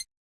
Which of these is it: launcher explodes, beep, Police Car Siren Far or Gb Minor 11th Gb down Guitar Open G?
beep